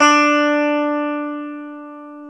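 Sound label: E 12 String guitar; Club-50 - Foundations Roland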